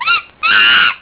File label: Monkey